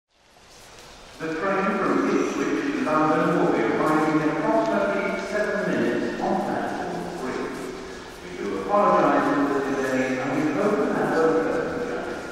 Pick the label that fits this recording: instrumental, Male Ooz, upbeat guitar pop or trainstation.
trainstation